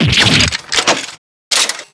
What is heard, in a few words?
damage